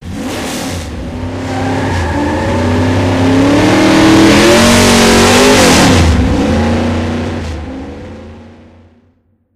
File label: escape